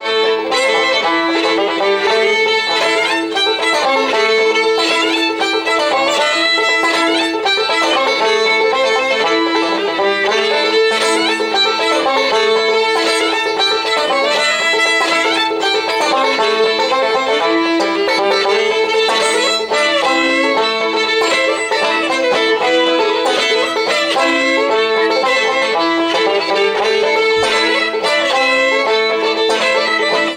Henry Reeds Breakdown, musicbox

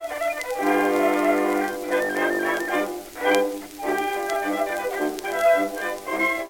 Jolly jingles, jukebox blues

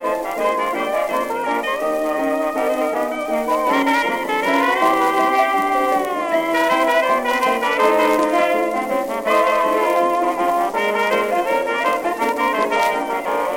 jukebox blues

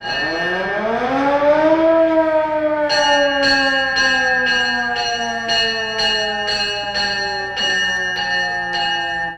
Old auto horns

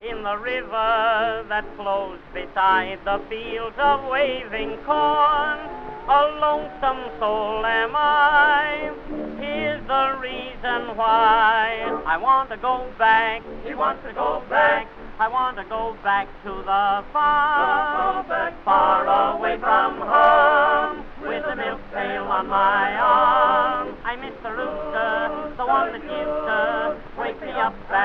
tenor and chorus orchestra accompaniment

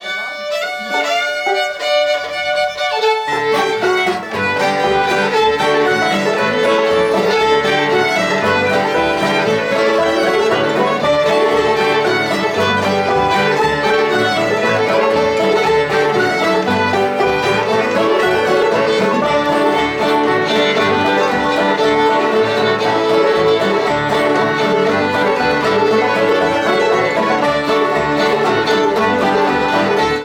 musicbox

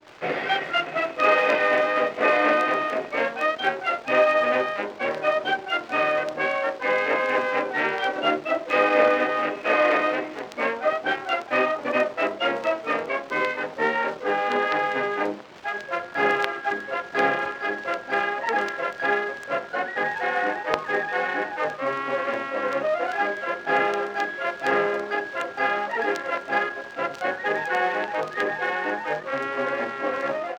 The US Field Artillery march; loc-edison

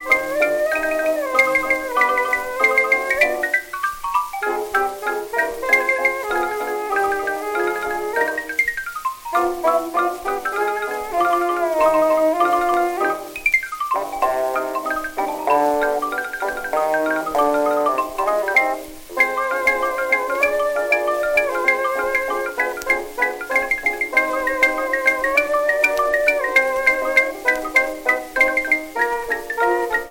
Jazz, jukebox

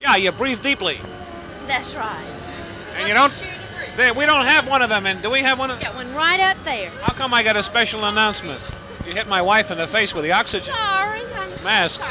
Airplane Jet trip

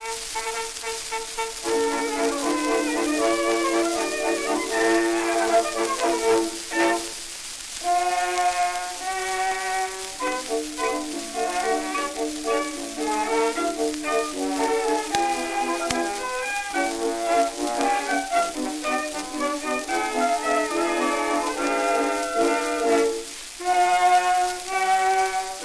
Woodland waltzes

